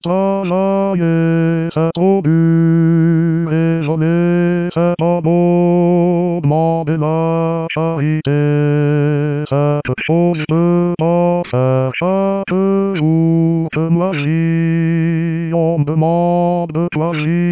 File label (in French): Baryton